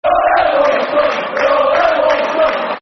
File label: We All Hate Wolves Scum, Football Chants; West Bromwich Albion Wba; fanchants